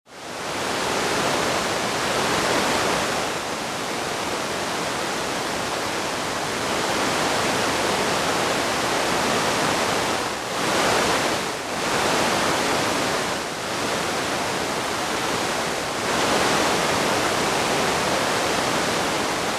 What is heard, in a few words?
radio storm